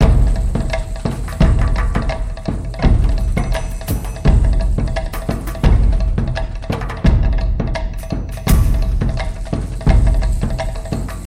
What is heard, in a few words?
duel BGM main